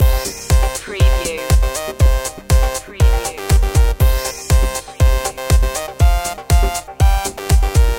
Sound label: dance floor loop